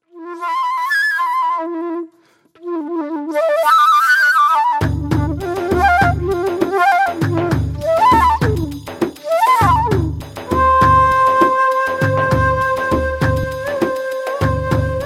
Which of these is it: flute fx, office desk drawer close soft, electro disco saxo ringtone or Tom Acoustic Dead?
flute fx